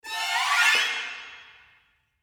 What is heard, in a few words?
short gliss up